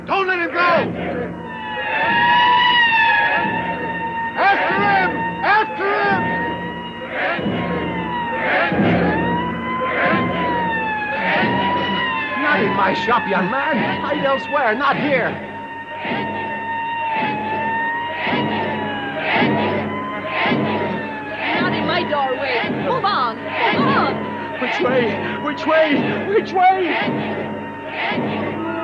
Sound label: Crowd, siren, timpani "Don't let him go"; The Man Who Collected Sounds